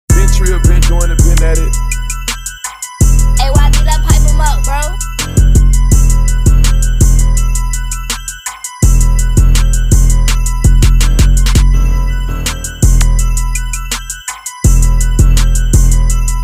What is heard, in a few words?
Instrumental